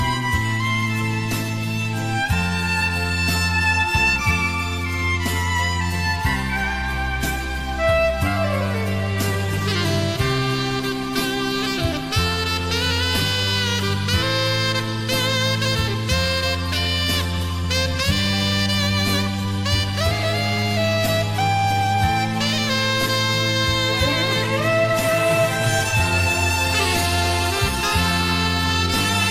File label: Sad Saxophone Ringtone